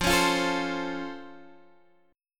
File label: C sharp Augmented Major 7th F, guitar chord